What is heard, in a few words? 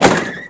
Vehicle Motorbike Land; Playable Tutorial Level